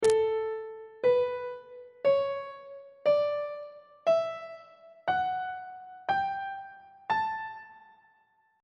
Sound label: a mixolydian mode note positions on treble clef